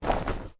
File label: Item Out; Take out or put away an inventory item; The Wind Waker; Zelda